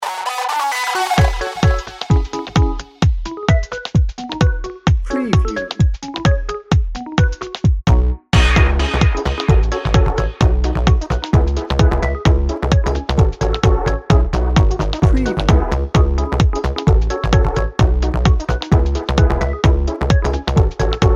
happy techno bed

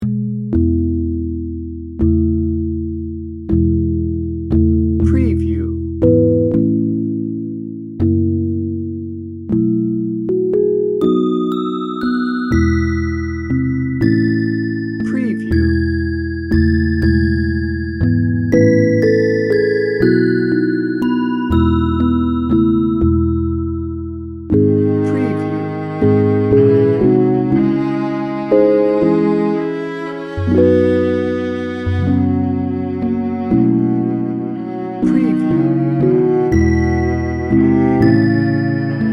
it came upon a midnight clear bed

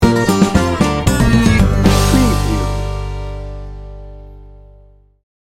hillbilly chaser sting bumper

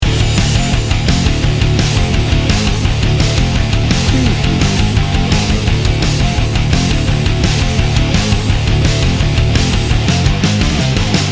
metal rock loop

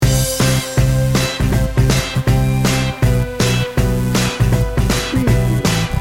motown loop

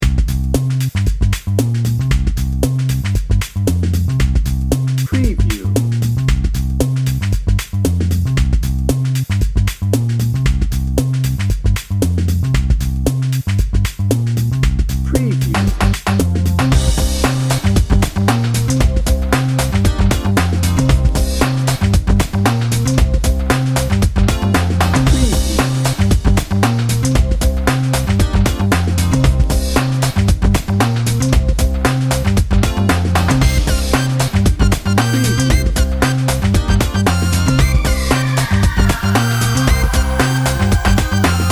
happy hip hop

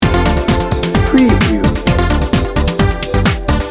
technology in motion loop

